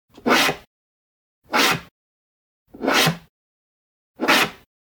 Generic Slide; boom library; The Interface

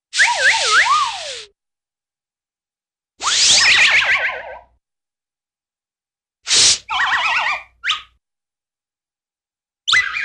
Airy Slide & Twirl (slide Whistle), Cartoon; Hollywood Edge; Cartoon Trax

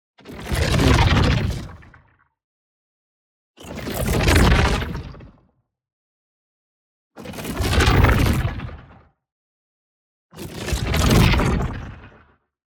WHOOSH SMALL Splintering Neutron; boom library; Matter

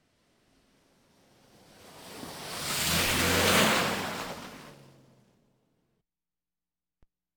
Automobiles various

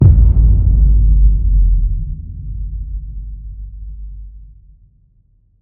HIT Boom, Cinematic Hits Designed

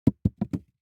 DICE on Felt, Throw and Roll, Foam, 1 One Die; Samples; ArticulatedSounds; DICE Sfx Party (Extended)

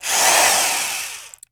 Electric Drill; Tools; 1001 Sound Effects

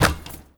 Street Ball Dunk Nylon, Street Basketball; SFX Kit

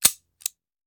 Hand Gun Foley; Sound Ideas; Guns

